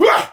Attack Effort; Warframe; Tau; Dax; Ashigaru

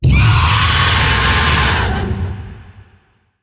Vocal Attack; Warframe; Zariman; Void Angel

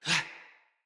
Attack, Battle Barks; Envoy

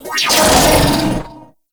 Auto Turret Open; Venus; Turrets

